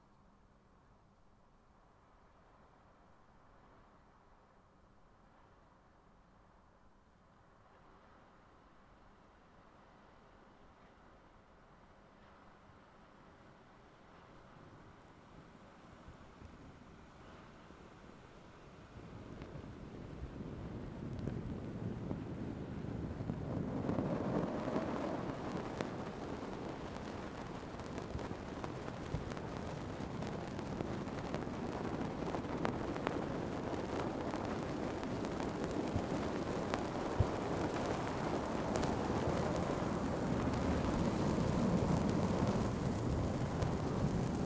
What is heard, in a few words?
Strange Cloth Rubbing